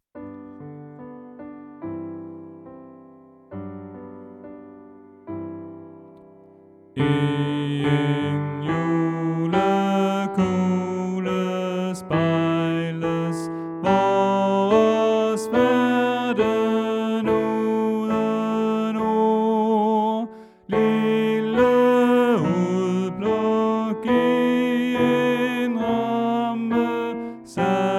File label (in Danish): Tenor; oevefiler